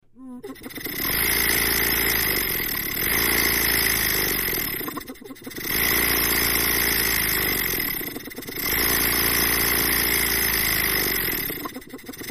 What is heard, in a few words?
Motor Pump Small, pumps